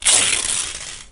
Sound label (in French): dechirer tissu